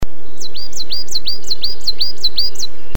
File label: parus ater